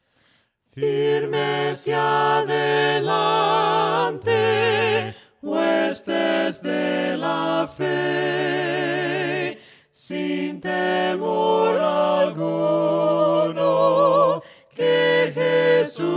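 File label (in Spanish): FIRMES Y ADELANTE VOCES, himnos a capella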